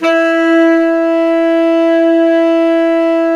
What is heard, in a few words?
SAX, Tenors; Giga Samples Collection